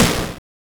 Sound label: Snare, Genesis